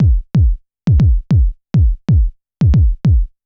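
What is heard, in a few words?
bass drum BREAKY, Techno 138 BPM; Best Service ProSamples vol.54; AKAI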